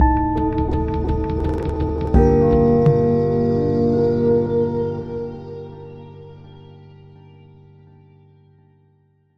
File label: Funeral March